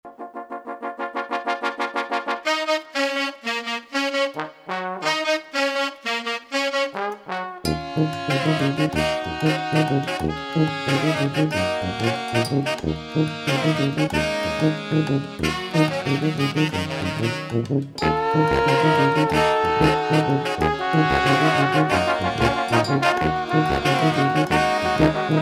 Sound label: Tuba Jam